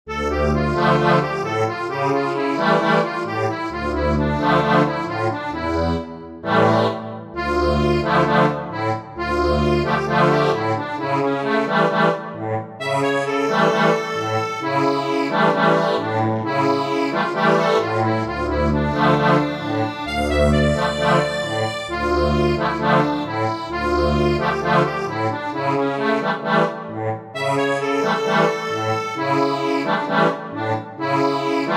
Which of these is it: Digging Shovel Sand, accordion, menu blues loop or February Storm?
accordion